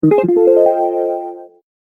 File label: simple single beep; ringtones; sms